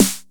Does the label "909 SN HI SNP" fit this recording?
Yes